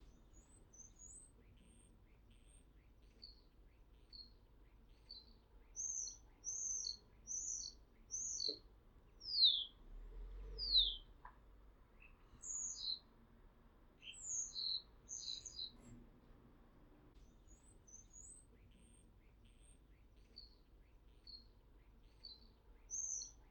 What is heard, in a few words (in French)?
etourneau sansonnet